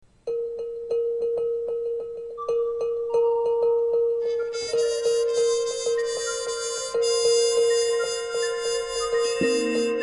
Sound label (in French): cosmic impro jazz